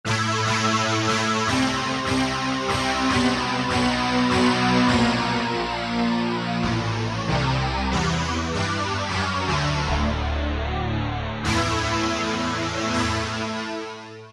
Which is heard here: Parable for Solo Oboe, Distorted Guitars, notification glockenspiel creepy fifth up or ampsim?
Distorted Guitars